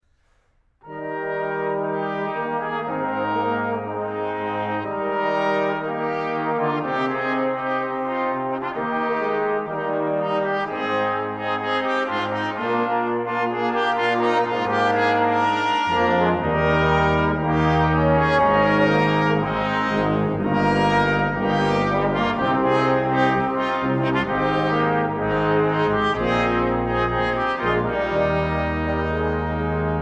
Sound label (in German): Tochter Zion Choralvorspiel